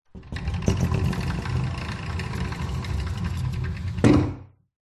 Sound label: household wooden sliding door roll light room reverb; zapsplat